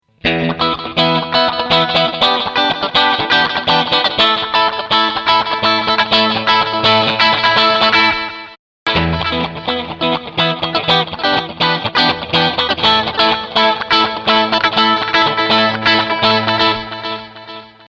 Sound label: Delay Tap